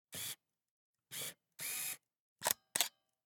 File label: Camera, Pentax KS2, DSLR, electronic, mechanical, focus, quick, snapshot; Eiravaein Works; Vaeyan IV